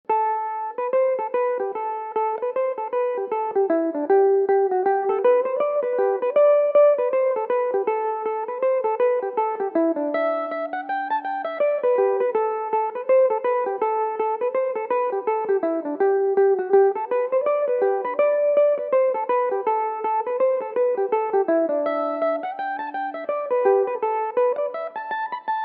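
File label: reel A dorian, irish mandolin; raheen reel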